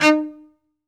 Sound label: SOLO CE, Strings; ILIO